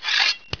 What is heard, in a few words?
knife deploy; banana